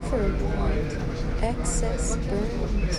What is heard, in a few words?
noisy